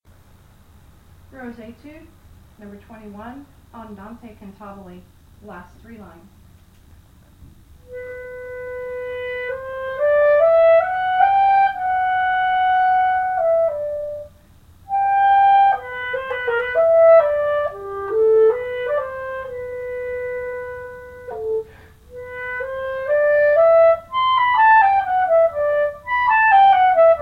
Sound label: Clarinet; Rose